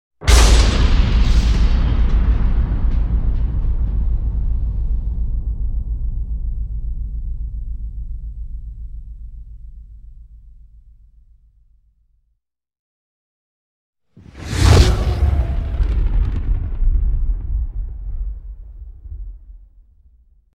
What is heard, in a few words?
Shockwave Explosion with Fireball, Tone Whoosh In; Hollywood Edge Sound Designers Tool Kit